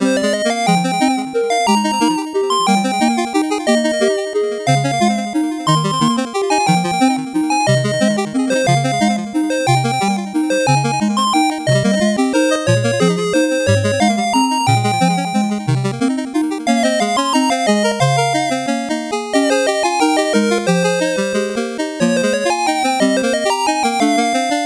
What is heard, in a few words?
8bit bgm normal